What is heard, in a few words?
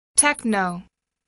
techno